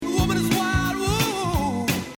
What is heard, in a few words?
wooooo